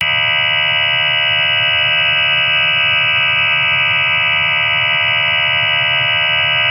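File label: prc 4 4 idle, psk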